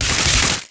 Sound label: dancing knife